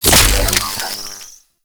Sniper Reload End; Warframe; Sentient; Caliban Prime Sniper

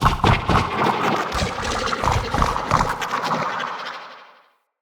Corrupted Slug Sub Boss Vocal Idle; Creatures

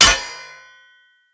Short Sword Medium Impact Metal

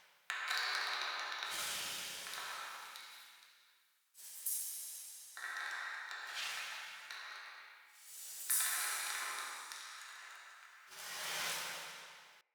Incense Burner Loop

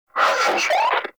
Scan Vocal Stop; Frumentarius

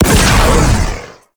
Heavy Cannon Fire; Crew Ships; Vehicles; Weapons; Warframe; RailJack; Corpus Crew Ship

